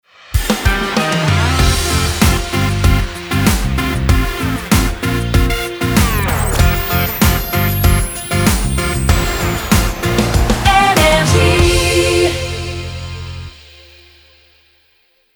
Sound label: Jingle Ramp; NRJ